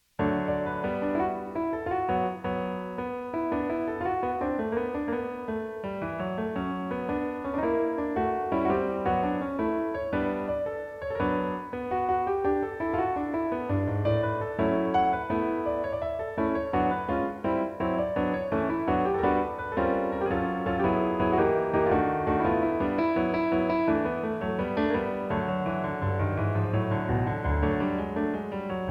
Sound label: Piano Solo